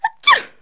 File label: sneeze, cartoon, human; KABOOM! - Wild and Wacky Sound Software